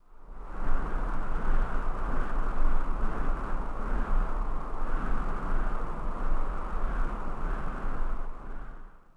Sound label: wind gust; Star Wars Obi Wan; XBOX